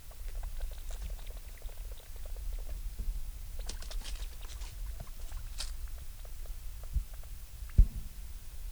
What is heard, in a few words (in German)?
zwirp knirsch, octodons